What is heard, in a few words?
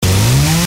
chainsaw idle to saw; tfa starwars melee weapons pack